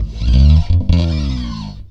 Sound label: BS CHPSTK, ChapmanStick; Roland LCDP02 Guitar and Bass